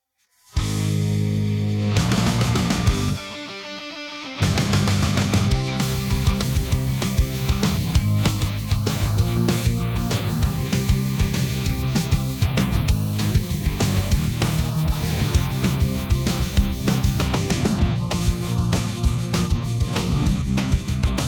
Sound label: Instrumental